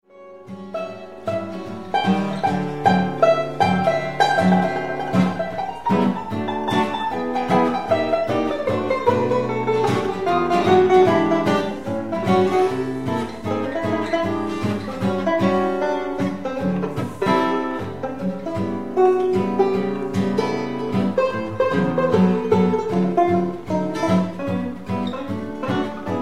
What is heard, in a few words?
Blues Duet